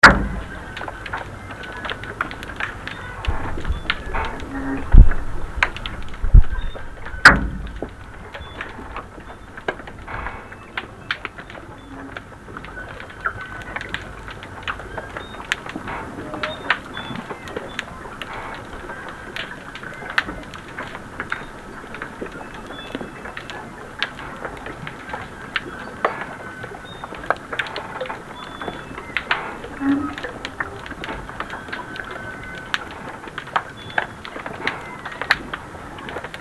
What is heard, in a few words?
santamarianavarrese boat harbour, underwater